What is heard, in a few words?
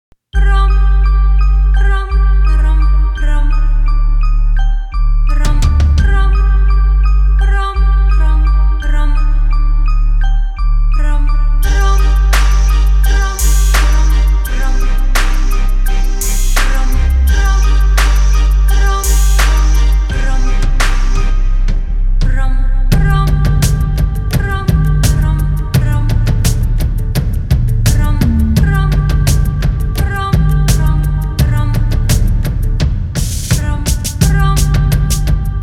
Instrumental